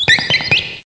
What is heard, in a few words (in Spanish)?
Grito de Woobat